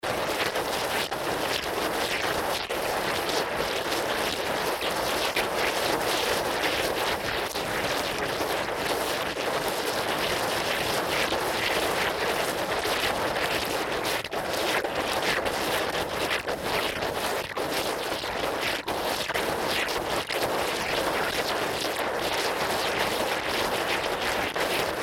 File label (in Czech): malostranska fontana